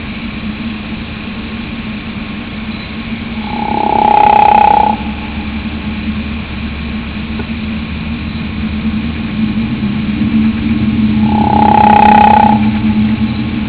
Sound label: Gopher Frog Call